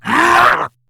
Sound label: Attack, Battle Barks; Warframe; Grineer Ghoul Sawman